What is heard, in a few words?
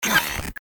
kybernetic link; comedy cartoon; elektronisch